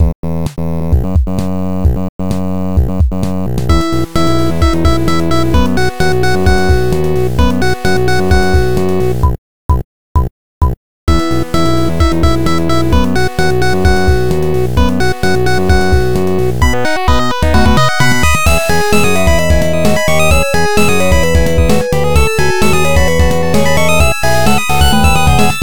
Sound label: bgm